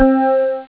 Klick organ

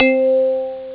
Celeste

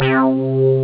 Korg Beau

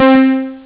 Synth Piano

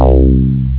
Mono Bass

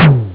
Elec Tom

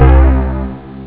Monster Bass

